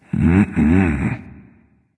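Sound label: nonverbal content; smite; voicepack; ullr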